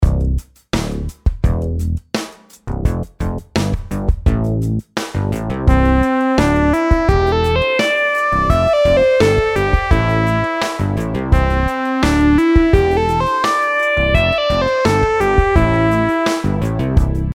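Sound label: melodies simple 8s triplets C major; melody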